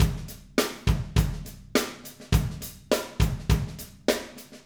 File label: Acoustic Live Drum; Platinum Audiolab